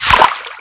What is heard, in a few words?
weapon impact water; Spearhead Demo; MacFormat 2003 June